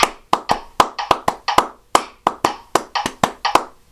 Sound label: clapped; 12 claves